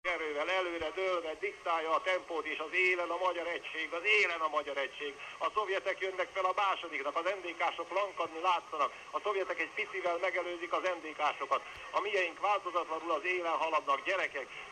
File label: digitar